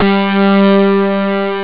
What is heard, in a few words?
metal, instruments; sonix; Rise Of The Dragon; chipmusic